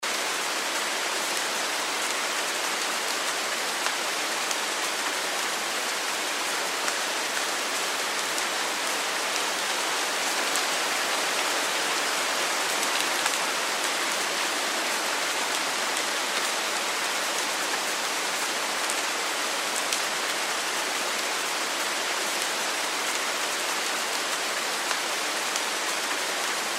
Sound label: rain medium; ambience